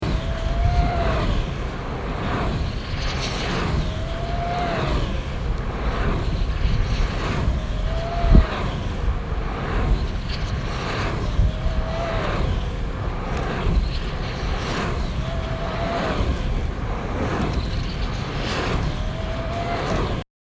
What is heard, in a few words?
blade whistle